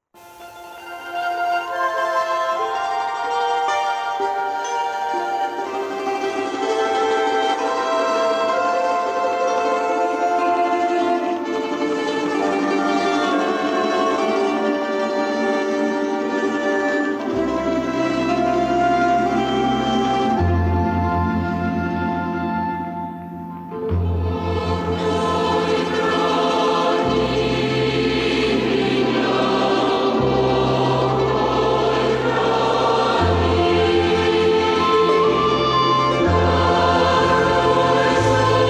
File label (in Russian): Хор со струнным; Бог мой, храни меня; МК-2000-Прокопьевск